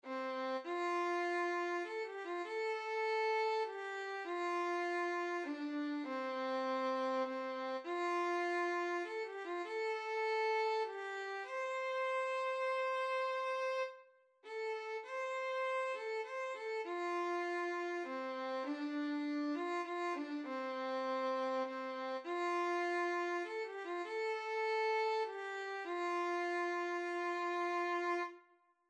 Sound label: Amazing Grace F Major Simple Violin